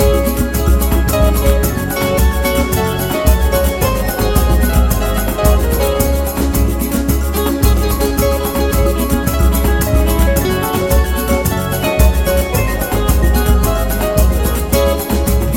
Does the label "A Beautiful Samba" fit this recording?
Yes